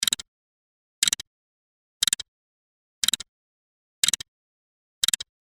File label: clock tick